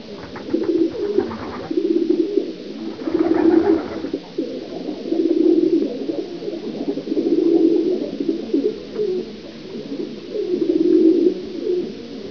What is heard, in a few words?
pigeon; rats attic